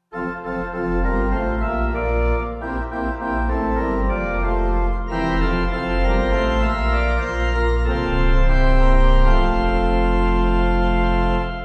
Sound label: Joy Joy Joy Pipe